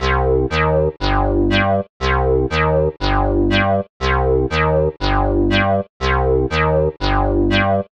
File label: 120 Cm bass, bass loops, trance; Trance Explosion Vol1